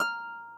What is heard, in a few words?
harp